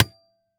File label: Impact Metal Impact Metal Pole Hit; Impacts